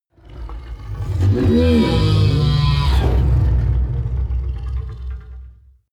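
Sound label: coloss groan deep long, creatures; kreaturen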